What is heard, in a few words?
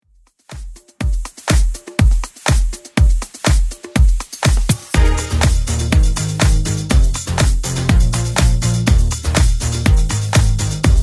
80s Club 122 bpm CLEAN